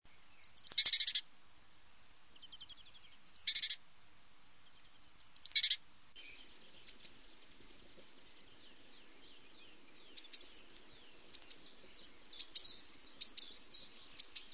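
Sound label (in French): Mesange bleue, chants d'oiseaux; sons nature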